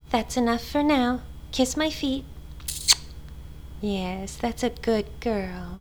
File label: shutdown